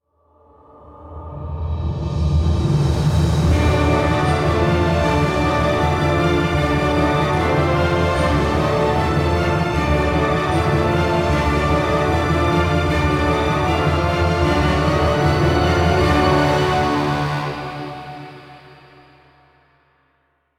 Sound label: Win Campaign; heroes of might magic v gamerip 2006; Dungeon